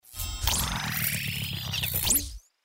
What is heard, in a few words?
ladder numbers flip out; Fondant Favourites StarTrail; Eyecon